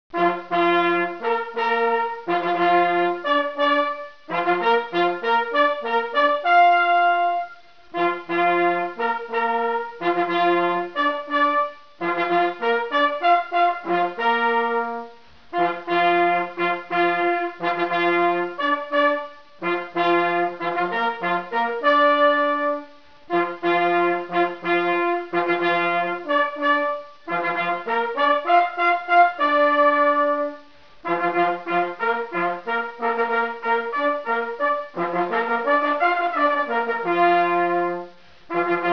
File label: fanfare 8bit stereo